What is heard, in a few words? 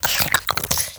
attack slobber, headcrab, creatures; headcrab classic; vox